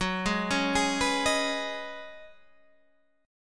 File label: C sharp 7th Suspended 2nd Suspended 4th F sharp